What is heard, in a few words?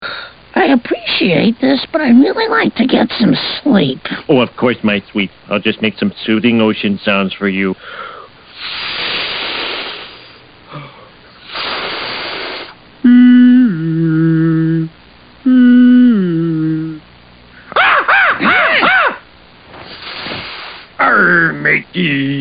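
soothing ocean sounds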